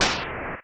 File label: Fire; mother2